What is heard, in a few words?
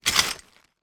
broken dish pieces in bag place down on carpet floor; foley; zapsplat